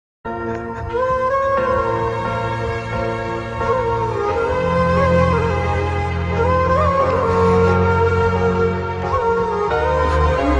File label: heart breaking love music bgm